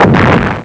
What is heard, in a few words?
boom; Amiga Soundtracker Sample Packs